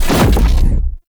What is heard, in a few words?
Pulse Projectile Deploy; Warframe; Gyre